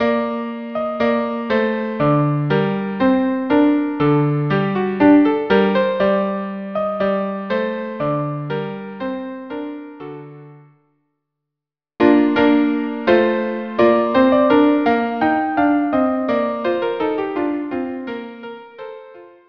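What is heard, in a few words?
viola duo; Almira; Menuet; Rigaudon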